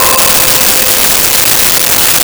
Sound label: New Age Pad C5; Nintendo; July 2020 Gigaleak